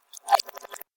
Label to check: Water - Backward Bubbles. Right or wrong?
Right